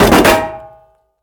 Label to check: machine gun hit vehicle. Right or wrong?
Right